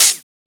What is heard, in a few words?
Open Hat; FLPRO